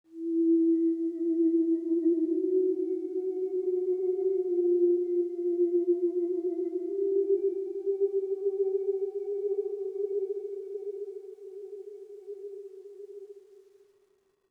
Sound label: Oneshot Synth